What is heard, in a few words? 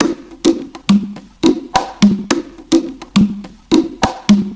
wobbly bongo, 105bpm; Zero-G; Freak Beats